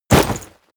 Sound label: step leather heavy